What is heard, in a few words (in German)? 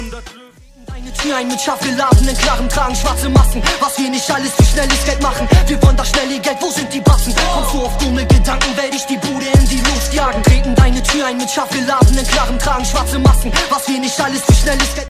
deutsche rington